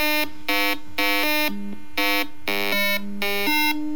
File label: Device tones